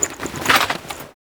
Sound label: Hunting Bow Load; Warframe; Foley